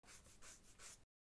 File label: scratching; human